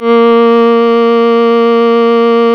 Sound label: Synclav FM STRINGS A#; Big Fish Audio